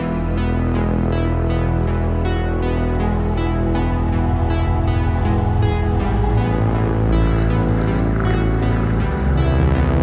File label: Login Screen; Fortnite Save the World; Fortnitemares